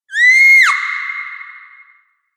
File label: Female Scream With Reverb; Screaming